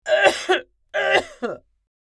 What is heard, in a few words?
Elderly woman coughing